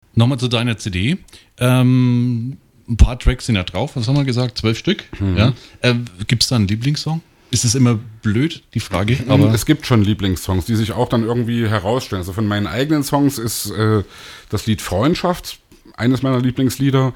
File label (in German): Lied Freundschaft